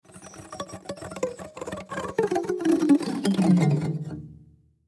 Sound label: Cartoon Autoharp Pluck Scale Down Muted Musical